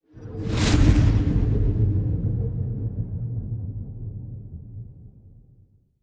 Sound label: destroyer move; aquatics